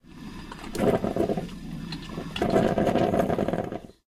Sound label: Coffee Brew